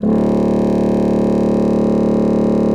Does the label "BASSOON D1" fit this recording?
Yes